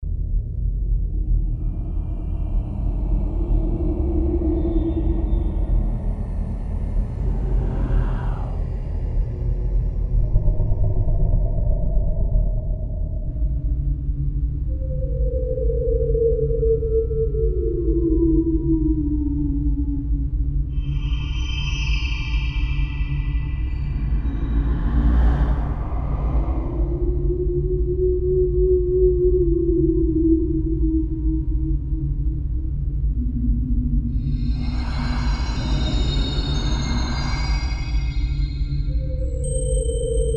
Echo Cave

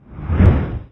giggle special action

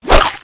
Crab Attack

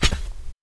foot; EmuClient

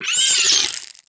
king spider die

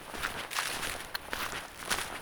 Fire Cat Walk; Seer; GamigoNA

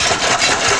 kid run

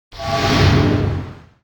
open

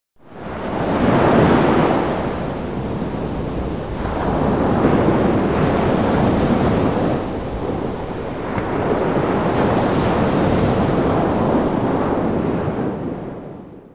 Coast

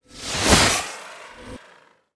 imp atk; Seer; GamigoNA

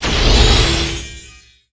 Hit Protection

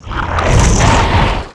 Stony Atk

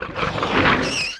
Skel Knight die

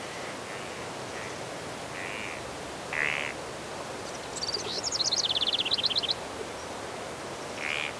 Dark Town

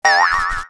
Pig run; PhilipPig; Seer; GamigoNA

